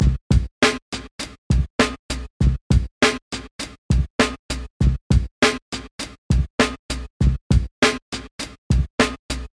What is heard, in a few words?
8th notes